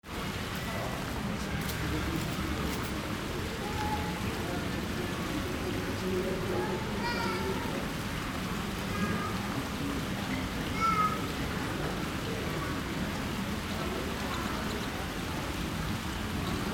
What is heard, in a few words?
village square with voices